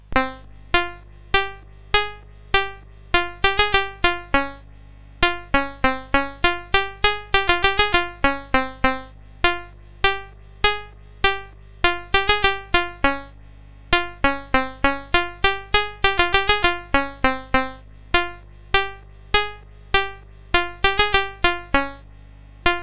Japanese Koto, CSG8580R5

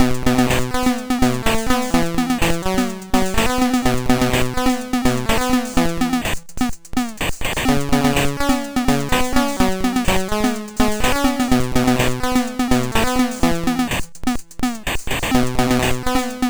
Fallen Angel, MOS6581R2

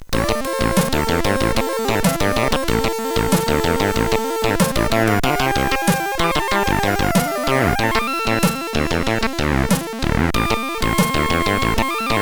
Sweet Noise note, CSG8580R5; sid; hvsc

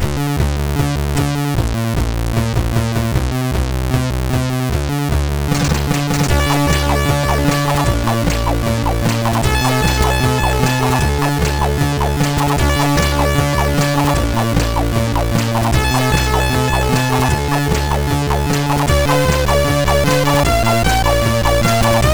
sid MOS6581R3

